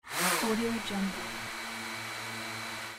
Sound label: drone start idle propeller rotation